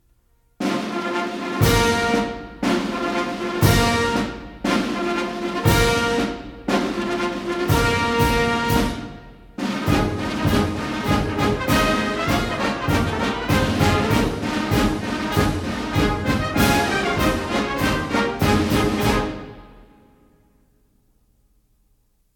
four ruffles and flourishes into flag officers march; Marine Corps Birthday Ball